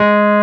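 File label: WURLY NO, Wurlitzer electric piano; Sonic Reality; Vintage Timetraveler; WURLITZ EP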